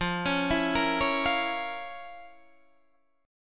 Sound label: F Major 7th Suspended 4th Sharp 5th Guitar Standard, chord; arpeggiated; 13,16,14,15,14,13; JGuitar